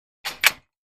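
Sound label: Gym Weight Equipment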